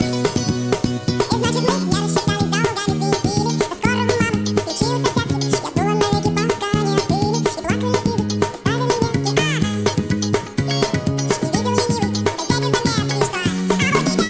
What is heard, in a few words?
pitch